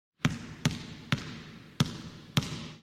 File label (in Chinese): 拍球; 战中; nba